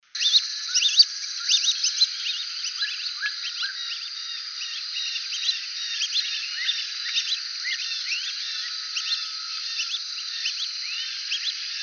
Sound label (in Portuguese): canto do marreca pe vermelho